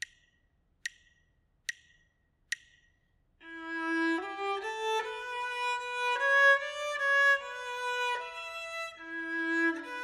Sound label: melodic dictation; eartraining